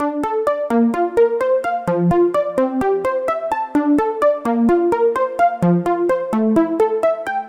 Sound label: Arp Loop, 128 BPM D min, Synths; Loops; House